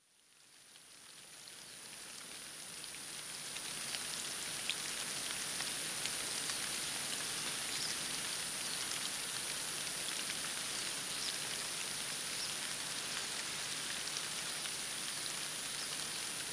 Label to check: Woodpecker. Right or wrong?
Wrong